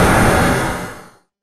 dragon fire breath; Creatures

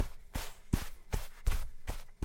footstep sand

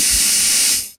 airlock open, sci-fi; freepixel

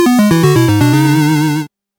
game over; freepixel; Game Events

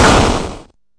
explosion; freepixel; Environment

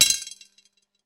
armor craft; Crafting

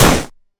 hit fire; Combat; combat impacts

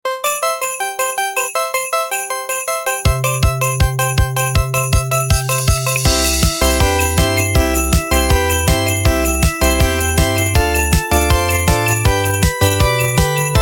christmas festive, music; seasonal holiday

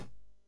hit heavy; Combat; combat impacts

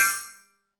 ice spell; Magic; magic spells

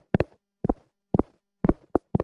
footstep wood; Footsteps

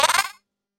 fishing cast; Crafting

